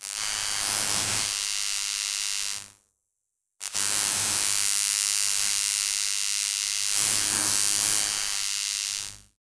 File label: random multiple electric